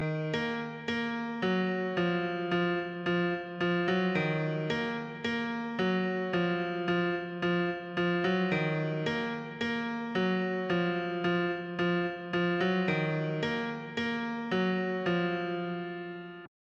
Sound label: instrumental